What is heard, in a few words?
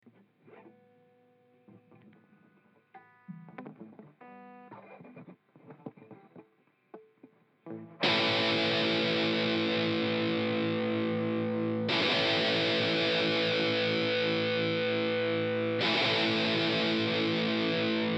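AM-C-G Mesa Dual Rectifier; ik multimedia amplitube 4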